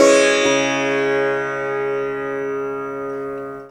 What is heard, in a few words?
SITAR LINE; SITAR RIFFS2